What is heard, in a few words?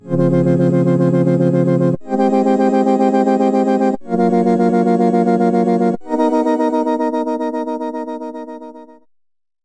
Sine Pad; Retro Noise 3 Instruments